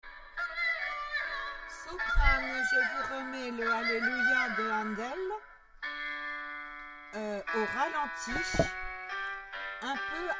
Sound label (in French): suite 2 soprani; hallelujah